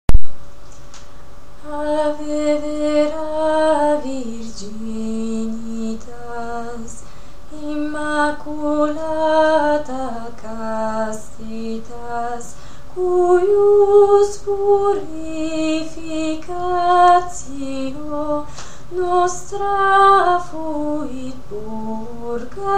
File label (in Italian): contralti; ave vera virginitas; desprez